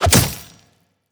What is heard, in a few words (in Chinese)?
重攻击命中